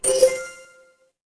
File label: ui chime